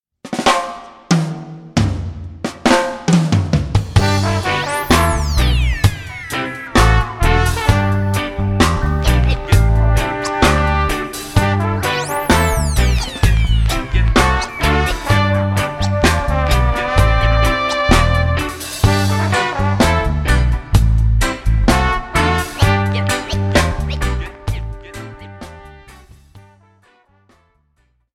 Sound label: Reggae